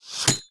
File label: garden zombie prepare